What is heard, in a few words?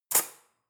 cutlery fork drawer handle place